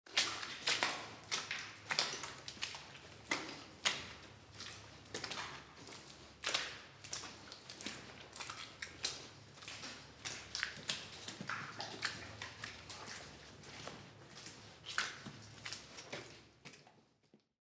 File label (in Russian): Po luzhe